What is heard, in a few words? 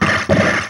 The Bite; qbert